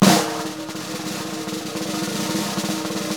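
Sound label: snare rolls; Brush&Rolls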